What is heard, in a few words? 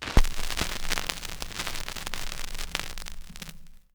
Record Start, Vinyl Record; Record Noises